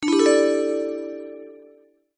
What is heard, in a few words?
lesson clear complete